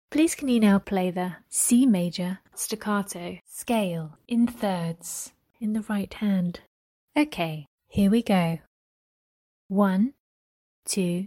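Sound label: C major staccato scale in 3rds right hand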